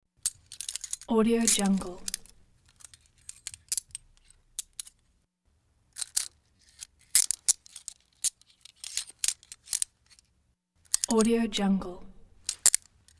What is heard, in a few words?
police handcuff clicks; sound ideas